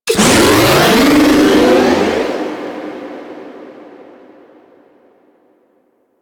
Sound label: Rematerialize; Warframe; Clan Dojo; Dojo Void